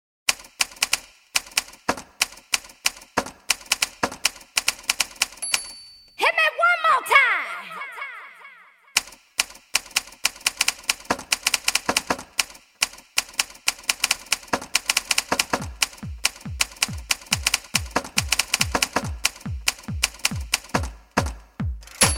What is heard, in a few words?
Typewriter